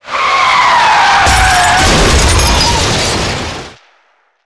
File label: car skid